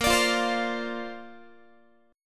A sharp Major Add 9th, Guitar; strummed

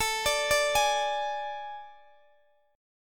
A Major 7th Suspended 4th, Guitar, DADGAD

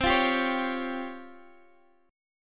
Db Major 7th Flat 5th C

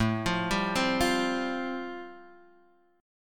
C Suspended 4th, A, guitar chord; Csus4/A Chord {X 0 3 0 1 1} arpeggiated

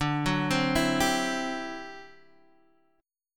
D 11th D, down, Guitar, DADGAD

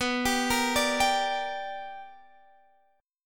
B Minor Major 7th Sharp 5th, Guitar, Drop D

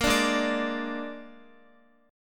Ab-Suspended 2nd Flat 5th, Guitar, chord; x,x,8,7,8,9; 4E,4A,5D,5G,5C,6F; strummed; JGuitar

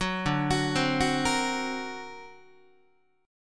D Augmented 7th D down Guitar; D+7 arpeggiated; JGuitar

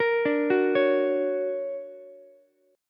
D Augmented Major 7th, down, Ukulele

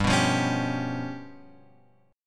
G 7th Flat 5th; G7b5 Chord {3 2 3 X 2 3} strummed

